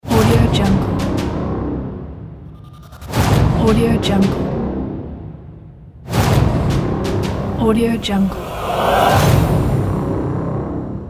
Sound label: action trailer logo